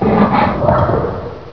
ichy alert

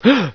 startle, scientist

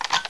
sniper bolt, weapons

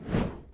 cannon throw; weapons

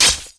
bayonet hit wall; weapons

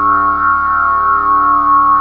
scream machine, ambience

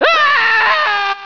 scream, scientist

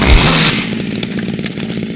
chainsaw slash, weapons

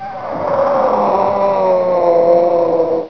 die

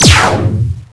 ethereal; weapons

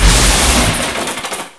beam start, debris

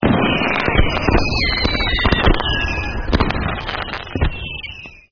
fireworks, ambience

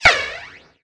bullet reflect

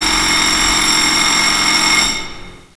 elevator ring

